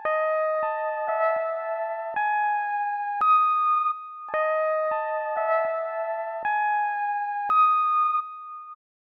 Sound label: 112 BPM C# Min Guitar, melody loop stem; Trap; Loop Stems; Cymatics; 2022 Melody Collection - Trap Pt 2; Lucky Number